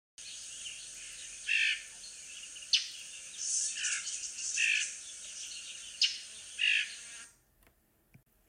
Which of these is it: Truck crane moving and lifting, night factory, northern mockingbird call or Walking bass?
northern mockingbird call